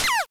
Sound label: Character Damage; starbites